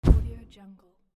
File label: flash transiton